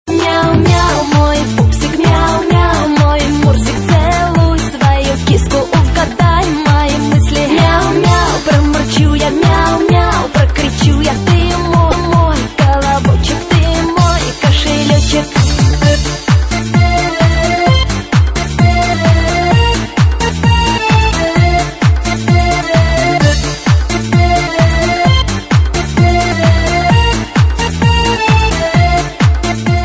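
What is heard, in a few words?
funny call to set for husband or boyfriend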